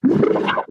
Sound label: stomper death, enemies; Factorio